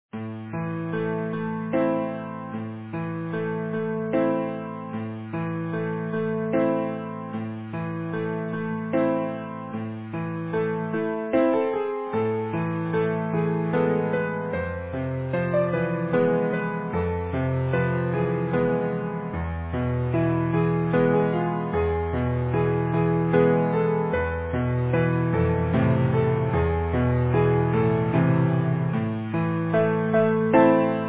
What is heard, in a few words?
Easy Piano